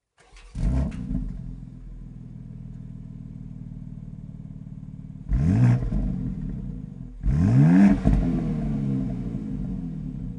Stock Exhaust BMW X4 M

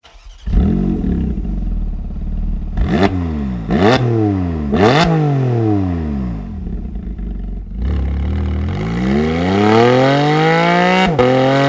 LINK PIPE SET Porsche 718 Cayman GT4 RS

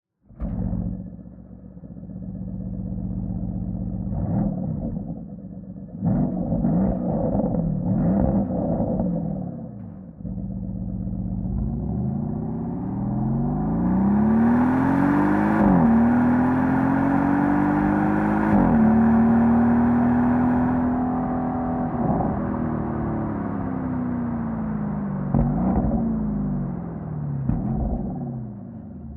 STOCK EXHAUST SYSTEM PORSCHE CAYENNE V8; turbo e hybrid akrapovic evolution line titanium performance exhaust

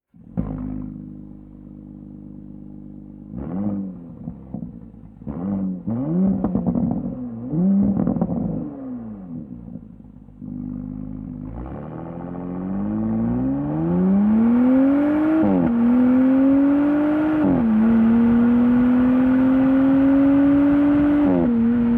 EVOLUTION EXHAUST SYSTEM PORSCHE CAYENNE 536.2; hybrid se hybrid akrapovic evolution line titanium performance exhaust

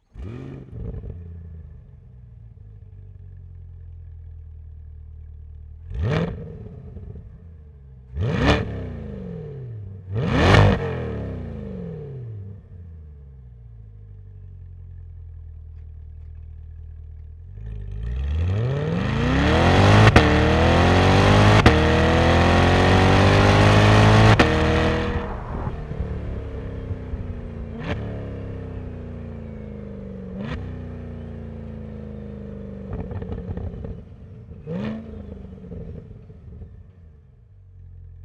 STOCK EXHAUST CORVETTE STINGRAY C8

